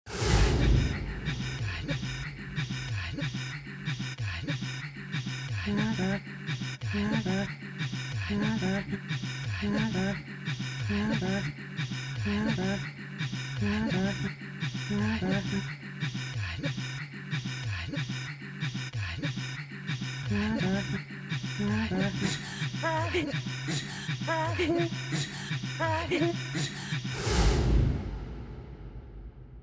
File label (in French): danse d'ensemble; inuits; citypercussion